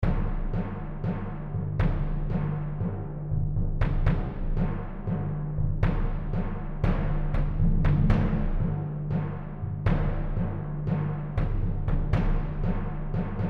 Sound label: Funk; Orchestral